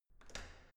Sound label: entrance door handle up normal